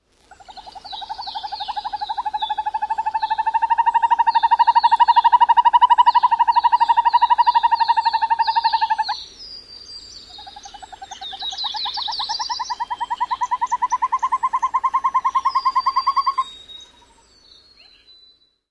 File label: trilling song; AK